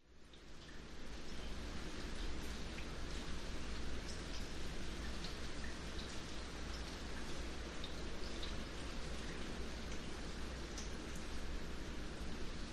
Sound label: nature rain distant thunder rumbles very light rain drips distant surf palm trees; blowing